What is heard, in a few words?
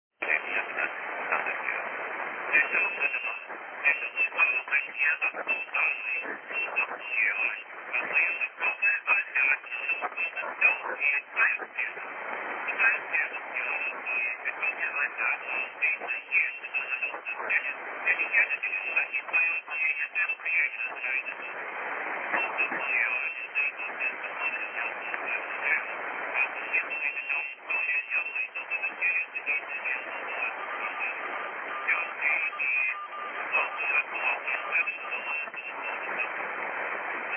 DSB splatter